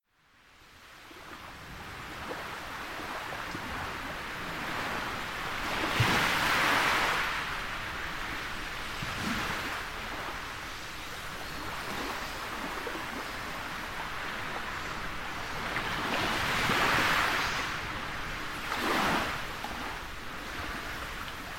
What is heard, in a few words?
ocean waves on a tropical coastline; soundscapes